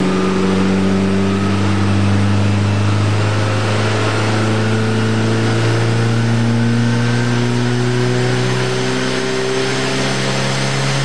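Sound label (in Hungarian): sierra, Kipuff hangok; Ford Sierra Cosworth test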